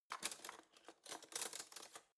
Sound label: cutlery spoon drawer handle long